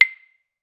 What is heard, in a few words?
Clave